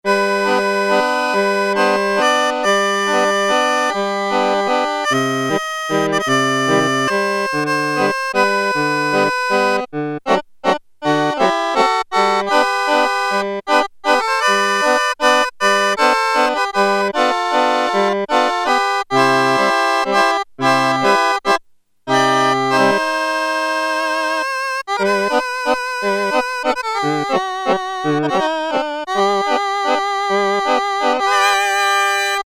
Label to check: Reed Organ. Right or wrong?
Right